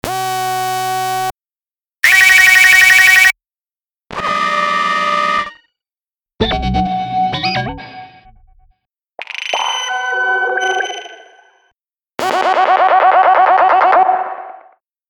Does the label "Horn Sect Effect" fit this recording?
No